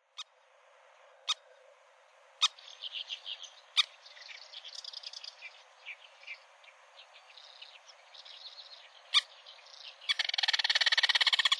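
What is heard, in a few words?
Crested Kingfisher; chikuma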